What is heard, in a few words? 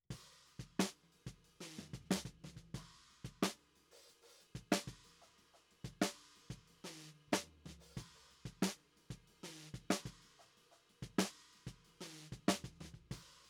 Snare